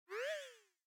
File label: Gecko Tongue; Super Mombo Quest